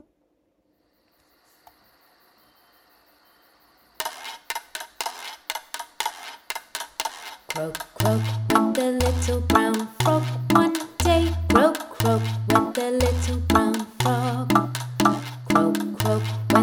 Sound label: Little Brown Frog Guiro Song